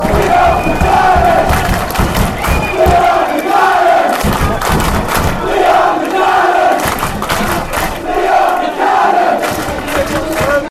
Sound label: fan chants; liam buchanan